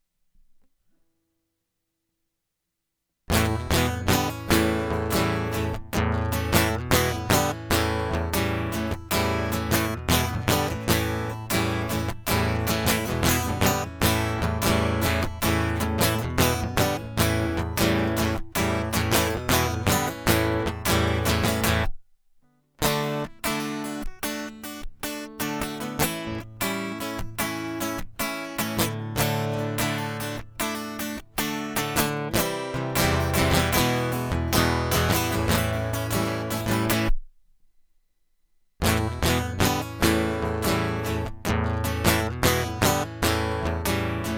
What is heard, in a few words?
Here We Come Guitar Loop; hyper terrestrial